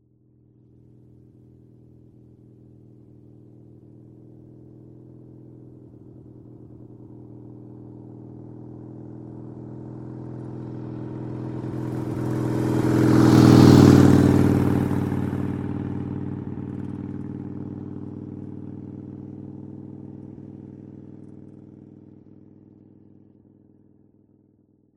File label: Harley Passes, 30 Mph; SOUND EFFECTS1